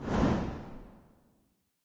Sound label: swish; Smite; Zhong Kui